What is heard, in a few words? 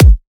Riddim Kick